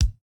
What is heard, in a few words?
kick, house